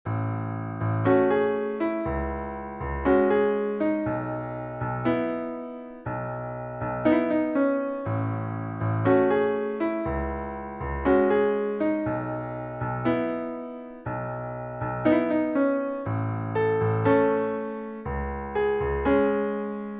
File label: birds piano